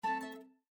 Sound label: Savanna Information Bar, windows 7